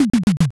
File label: drum fill